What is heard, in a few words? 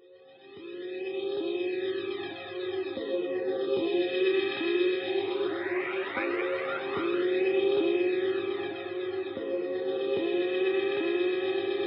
808 is A#